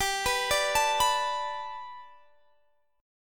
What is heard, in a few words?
G Major Add 9th chord